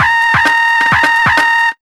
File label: FX LP, Big Beat; USB Soundscan vol.25; Big Beat Drumloops; AKAI